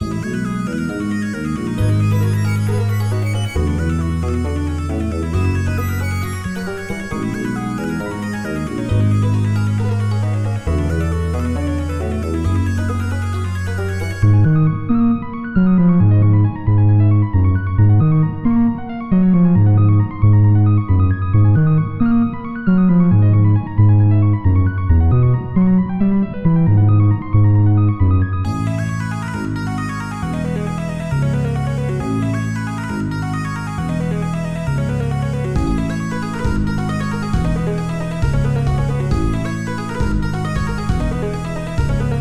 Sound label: Drum Jazz; frogatto friends